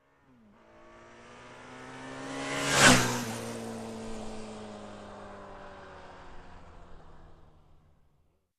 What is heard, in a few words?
Racing bike passing by